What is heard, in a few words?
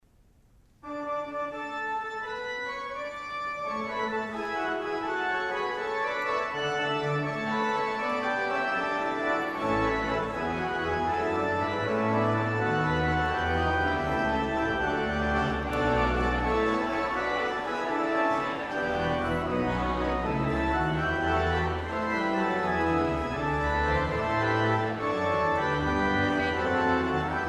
Fughetta on 'People, Look East', organ